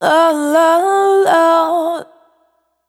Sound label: Urban, Single Voice Melodic Loops, Vocal Samples